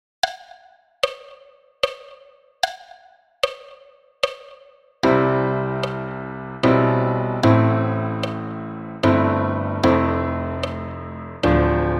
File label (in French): Piano a 75 bpm